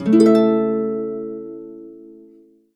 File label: harp chords, A maj C#2; Complete Harps; USB Soundscan vol.62; AKAI